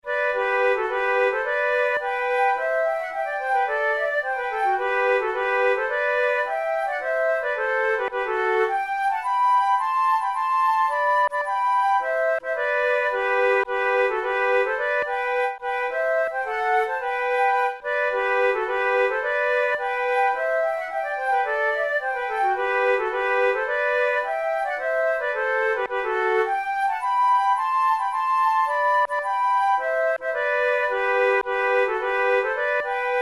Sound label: duet, flute